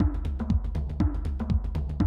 120, Classic Drum Loops

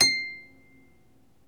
Troubador Harp

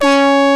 ANALOG COMBO; OMI - Universe of Sounds

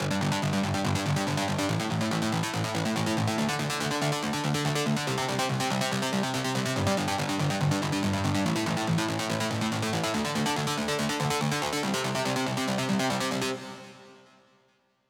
PWM Kit 142BPM Verse - Dist Arp WET, Gmin; Constr Kits; Melody & Synth; Vengeance; Essential Clubsounds Vol.5

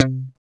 GTR Funky Strat, Funk & Mute

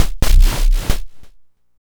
Crunchy, Beats

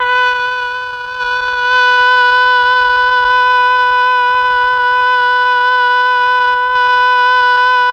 MEL OBOE

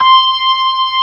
B3 C 6, organ; AKAI S-Series CD-ROM Sound Library VOL-1

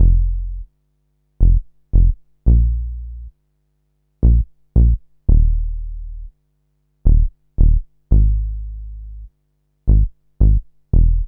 Rhodes Bass, Drum & Bass; Fluid Dynamics

